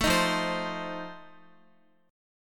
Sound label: F 7th F down Guitar; strummed